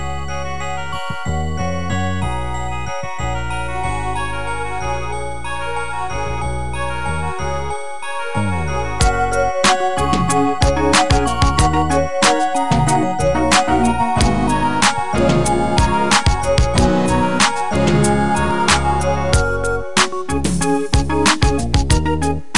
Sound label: karaoke